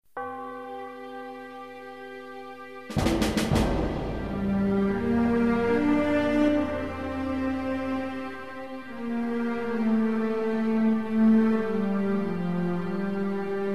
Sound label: roman defeat, music; 0ad